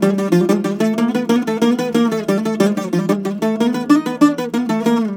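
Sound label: G, bouzouki; USB Soundscan vol.53 - Mediteranean Traditions; AKAI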